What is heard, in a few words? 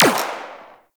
Rifle Fire; Warframe; Venus; Venus Flying Nullifier